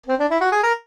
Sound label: jingles saxophone; Kenney's Sound Pack; Music Jingles